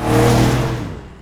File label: car sound; rushhour android